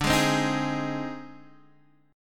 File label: Ab Major, D, guitar chord; strummed; Ab/D; JGuitar